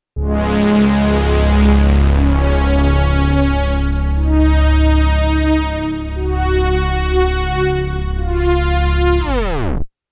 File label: Tape